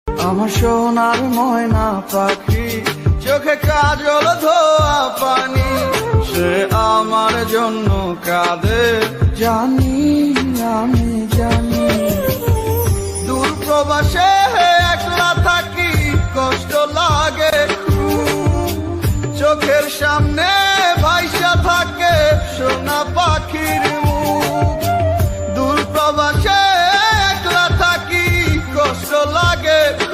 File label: RINGTONE, BENGALI